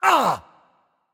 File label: grunt hit; smite; voicepack; lancelot